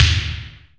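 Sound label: INDUSTRIAL; E-MU Producer Series Vol. 2 – More Studio Essentials; Composer; Technopourri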